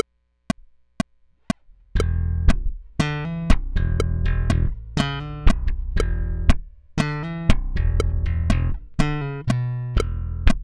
bass, xmas

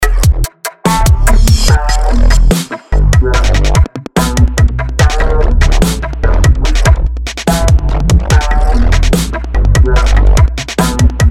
funkstep

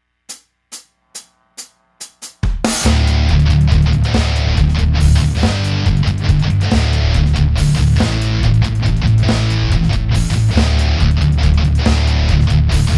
metal no lead, xmas

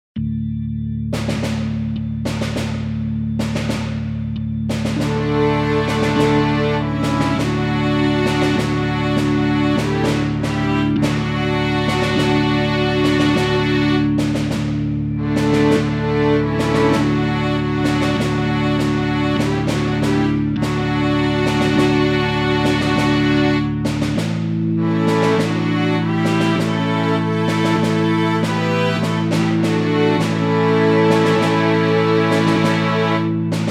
guitar, xmas

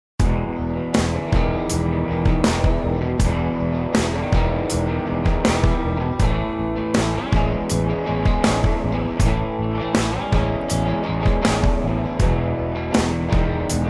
Vox Scratch; finale

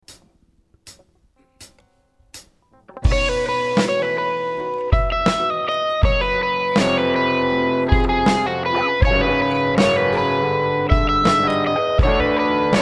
guitars

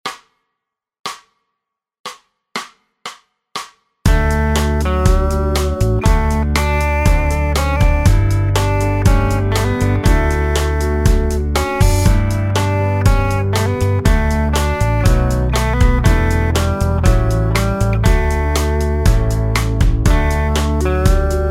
acoustic lead, xmas

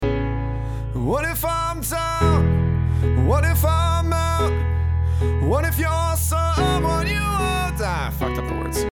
falling experimental

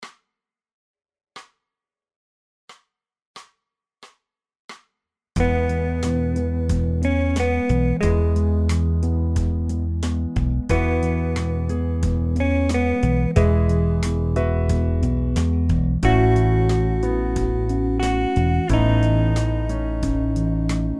acoustic lead, xmas

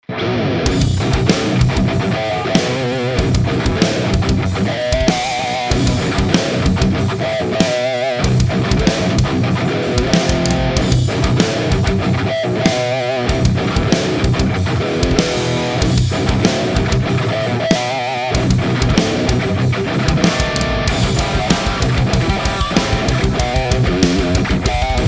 boom

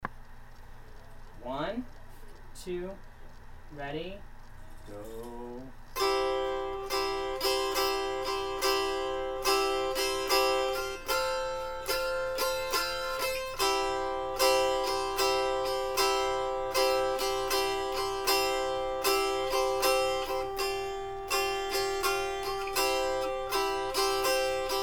amazing grace pattern, dulcimer; xmas

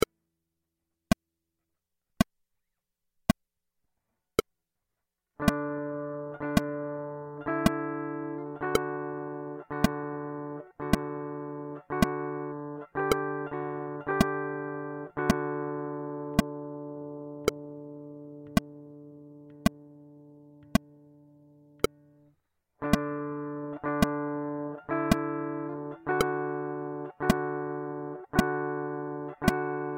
little drum; xmas

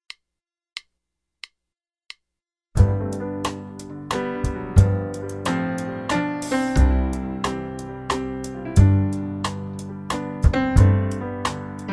amazing grace faster; xmas

